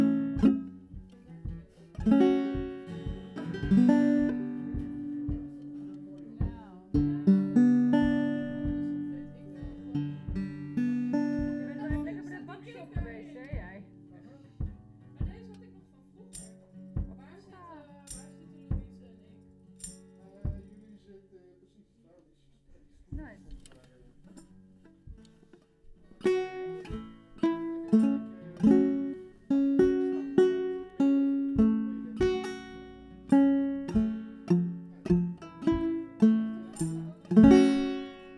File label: Banjo